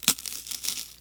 guard; kult

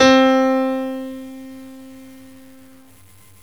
PIANO

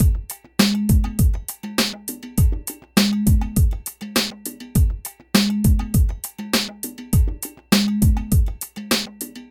Drum Loop w perc

